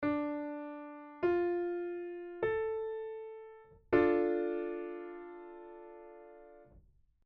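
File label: d minor chord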